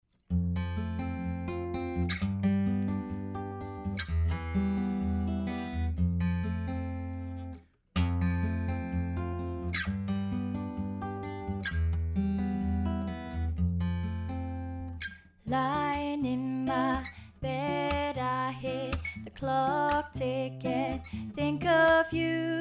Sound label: Acoustic Duo